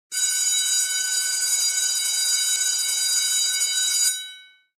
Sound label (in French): Sonnerie cloche école